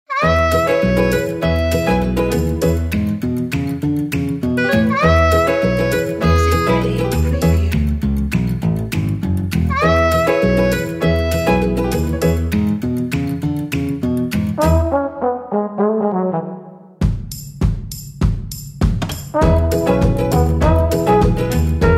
Folk Jazz Happiness

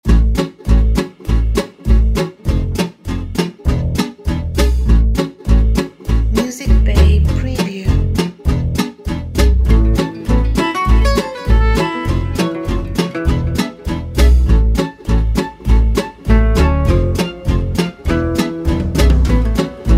Swinging City

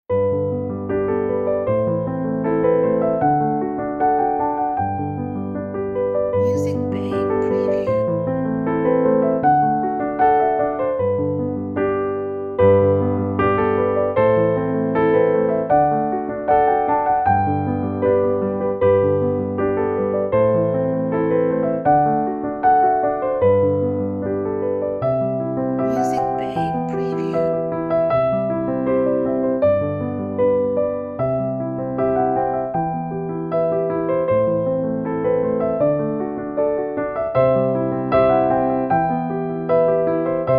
Hopeful Uplifting Piano

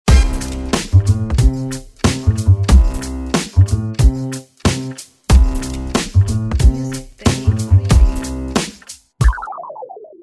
Lazy Hip Hop

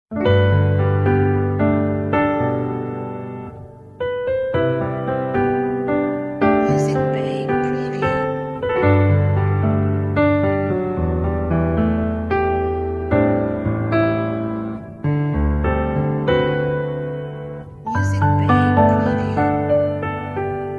Piano Logo

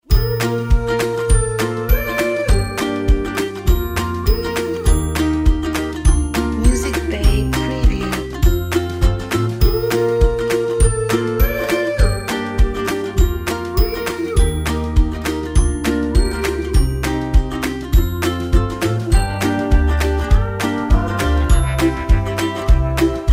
Happy Upbeat Ukulele

